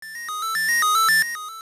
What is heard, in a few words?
Ghini Appear; A Ghini (ghost) appearing in the Explorer's Crypt [OOS Dungeon 7]; Zelda Oracle